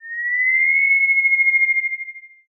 kettle

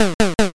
pig

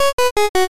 stairs down

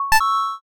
buy

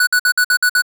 alarm clock

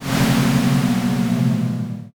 sigh crowd

